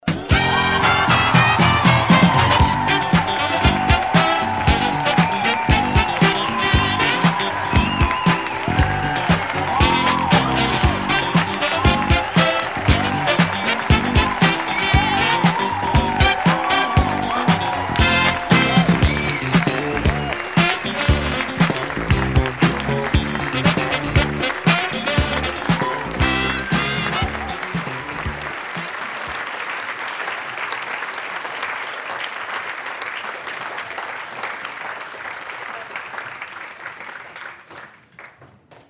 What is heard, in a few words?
open theme with cheers